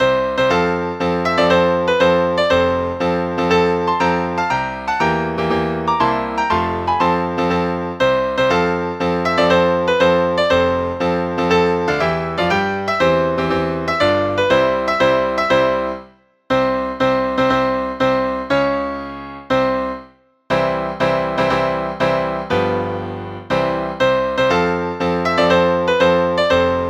start

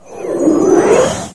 Magic

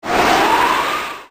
wind

